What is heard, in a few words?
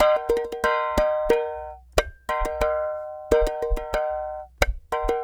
clay pot, world percussion